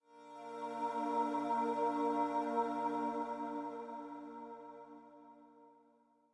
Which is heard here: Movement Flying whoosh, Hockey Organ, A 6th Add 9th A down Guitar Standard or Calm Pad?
Calm Pad